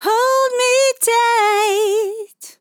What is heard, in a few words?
LYRICS Fills 120bpm Fm; hold me tight